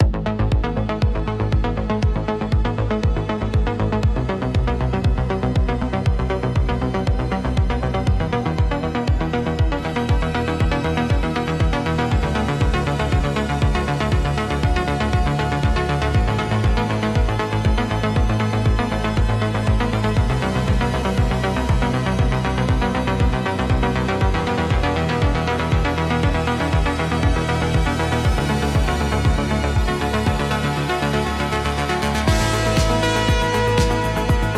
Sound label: Electronic Impulse